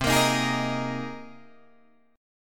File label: C Minor 7th Sharp 5th C Guitar DADGAD